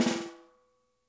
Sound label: dry, snares; Spectrasonics - Burning Grooves CD2